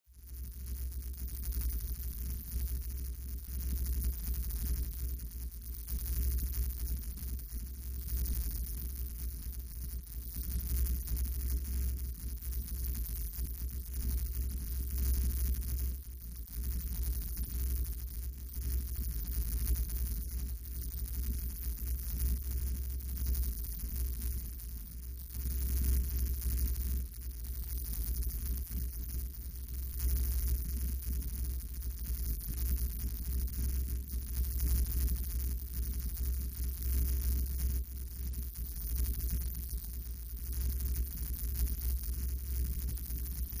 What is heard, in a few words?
Elect Energy Field, buzz